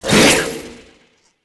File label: Whip Whoosh; Warframes; Khora